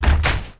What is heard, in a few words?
beast move; Akeron